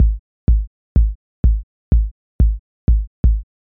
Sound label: deep house drums